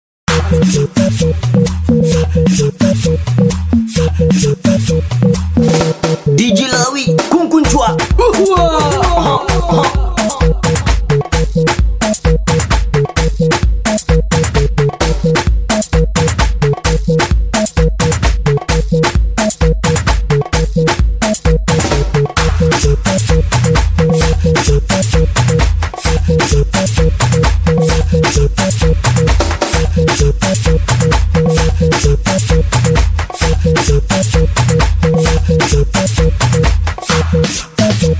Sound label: Instrumental